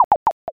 dice

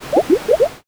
poison

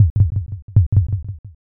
heartbeat

